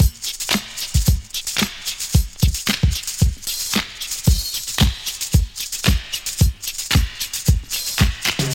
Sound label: 113 bpm fresh drum loop b key